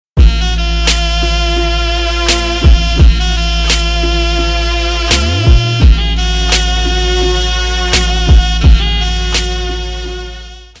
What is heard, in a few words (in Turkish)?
trap remix